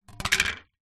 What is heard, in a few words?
foley plastic thin square panel plate down on wooden floor; zapsplat